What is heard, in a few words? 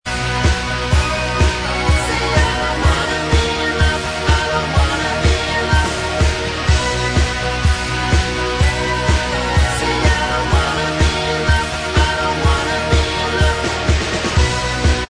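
Bvox Track, backing vocals track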